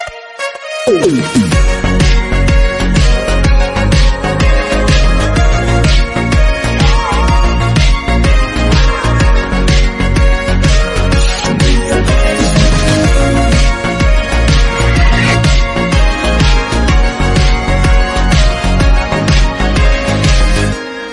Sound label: Instrumental; ringtones